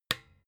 desk lamp on

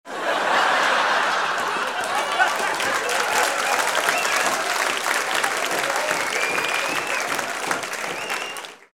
laugh applause

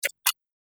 switch

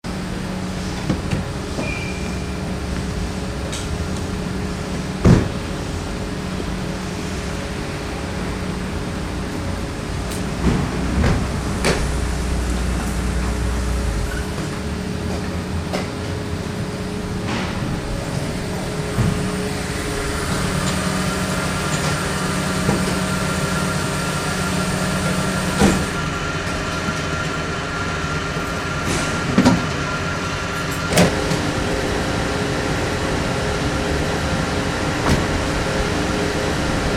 filling station atmo

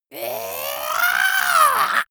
monster scream high

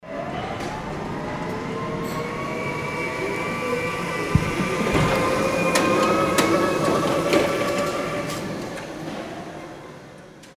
forklift truck passing

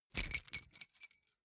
key ring small rattle air hard

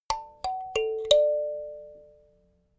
notification kalimba sequence major

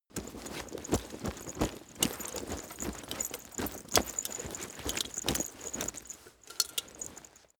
leather metal movement hard

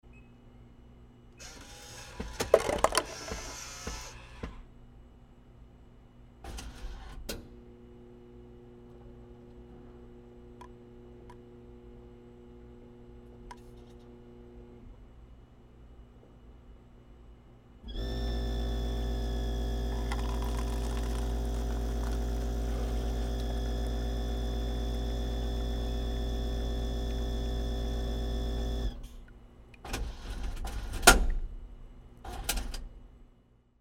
coffee vending machine

